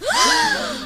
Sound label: crowd gasp; ooh ahh; human